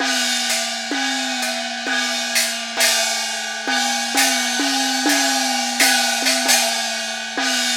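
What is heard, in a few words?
percussion 130 BPM, left; INIS - Opium; PERCS 130BPM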